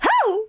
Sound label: punch hoo; birdo; SM64 CO-OP